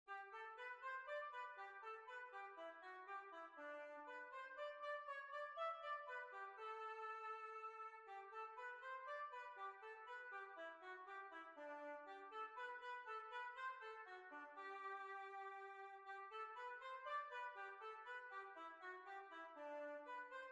Kerry Mills Barn Dance

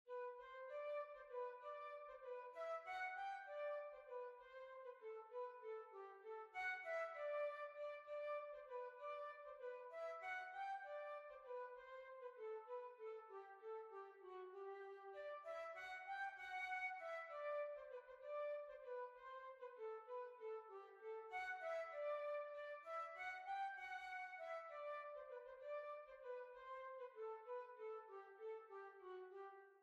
Waltz; Harry Enfields Waltz

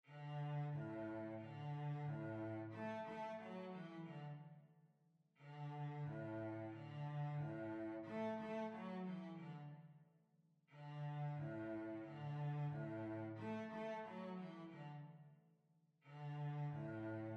Violoncello

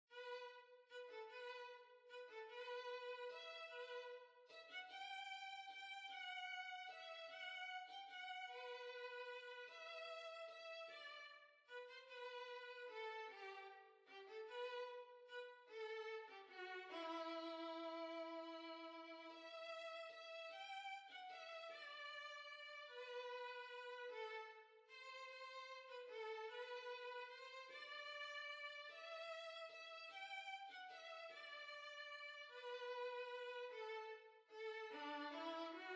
Waltz, Violin

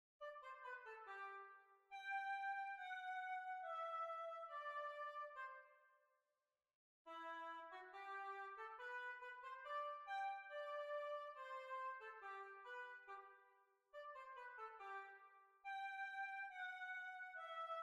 Hornpipe; London Hornpipe

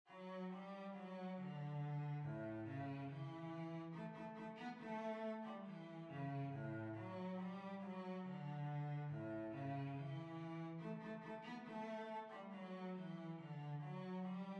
Reel, Violoncello

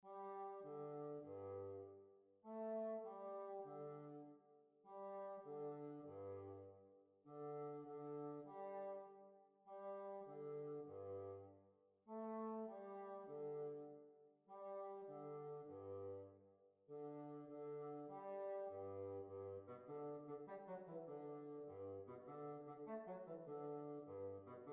Jig; Mr Richardsons Jig

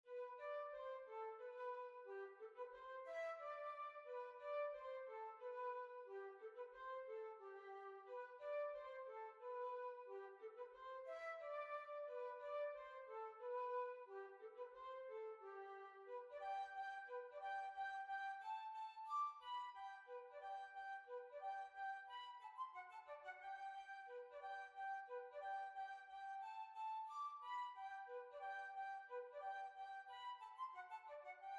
Waltz

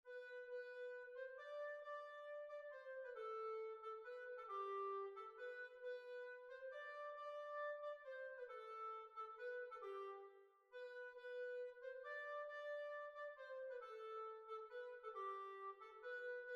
Tyrol Waltz; B flat

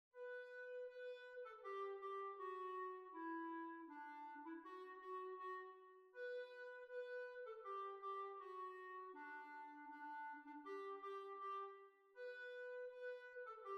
Hornpipe Morpeth Rant B flat

